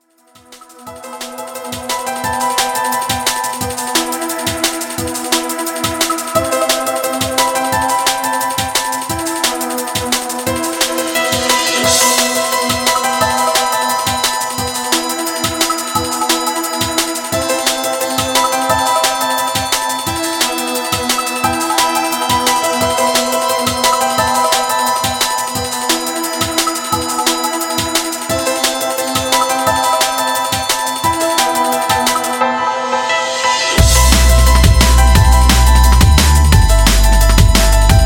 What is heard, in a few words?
Falling Sweep; Incident